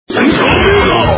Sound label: laser